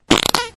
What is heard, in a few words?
Fizzle fart